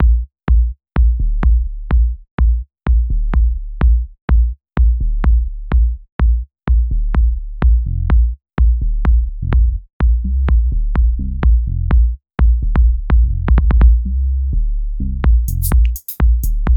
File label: kickdrum